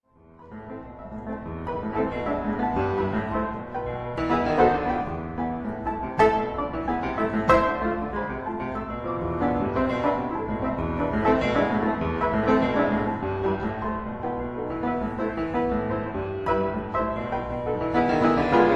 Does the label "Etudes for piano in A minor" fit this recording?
Yes